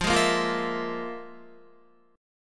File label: F Minor Major 7th Double Flat 5th, Guitar; Listen to FmM7bb5 strummed